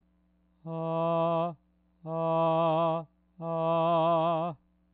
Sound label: bal on